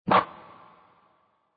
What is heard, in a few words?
pin